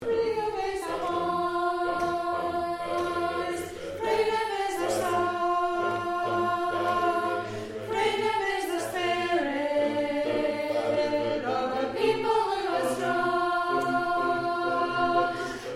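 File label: soprano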